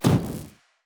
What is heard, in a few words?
projectile; oracle; Shelter